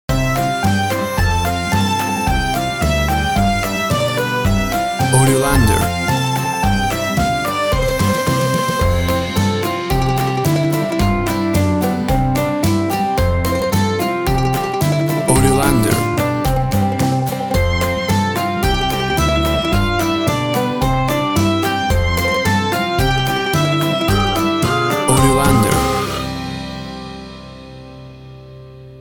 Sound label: Uptempo Silly Happy Music for Kids; Silly happy and fun music for kid